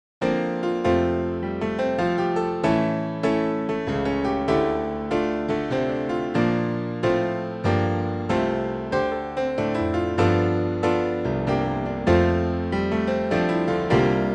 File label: come to the river (bass)